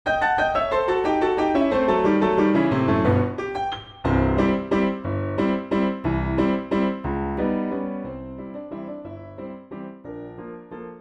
Waltz in C Major

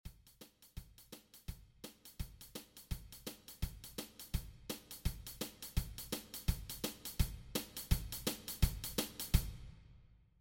big band swing; Sylva Train Runnin

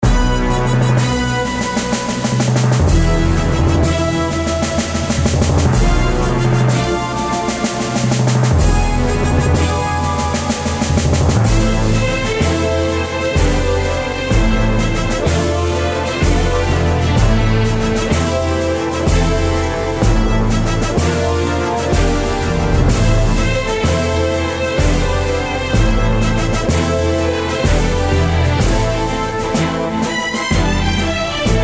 Bolero

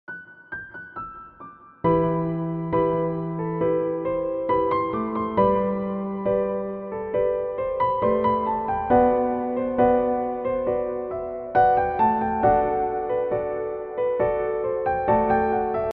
Piano Ballade

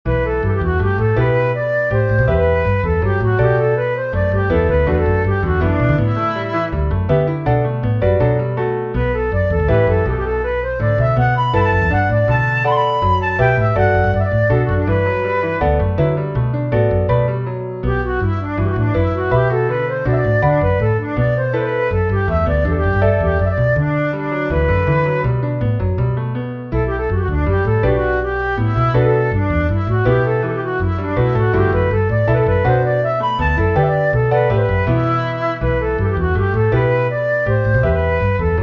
waltz; on the surface of unknown

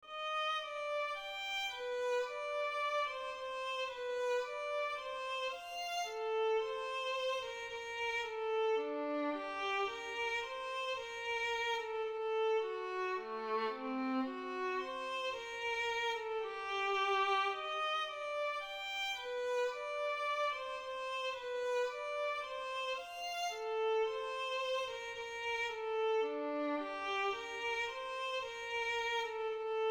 Soliloquy for Violin

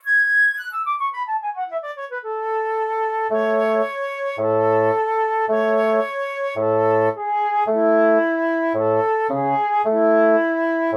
Woodwind Trio in A major

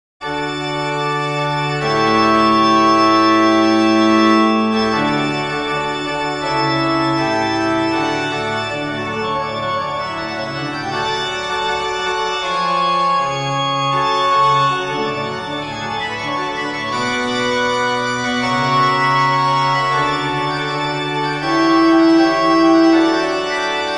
organ transcription (plenum and flute); Rex Tremendae

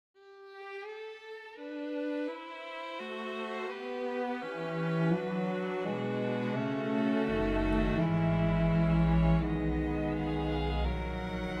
Enharmonic Perpetual Canon; no 2 for choir and orchestra

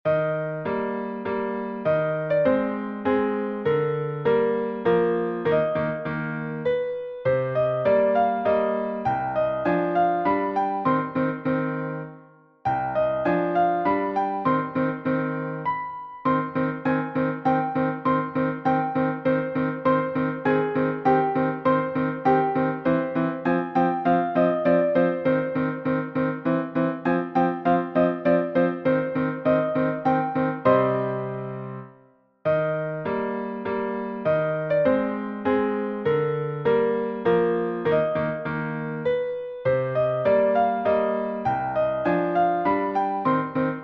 waltz

